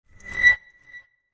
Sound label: Short Horror Transition; Scary Tone Sound Effects; Scary Tones (Sound Effects:Horror)